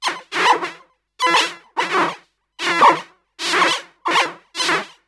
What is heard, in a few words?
Alien Chatter, sci-fi